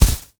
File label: Cloth Armor Hit; Game Essentials